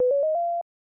Item Chime; Cream Pies

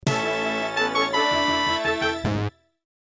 General MIDI; quest for glory 1 original soundtrack; Thief Fail

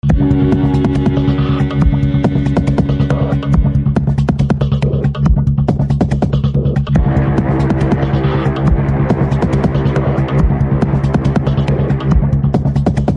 music suspense; dragon fin soup

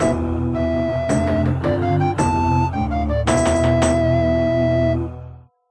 Entrance; Fremennik-style Wood; Player Owned House

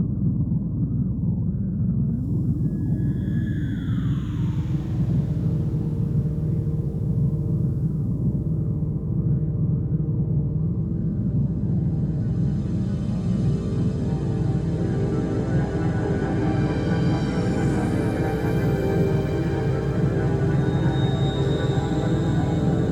Ingame Music Menu

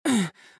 female pain shout; out of reach treasure royale 2020 gamerip pc

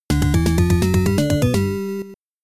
Ruins Level Complete; brian the lion; amiga

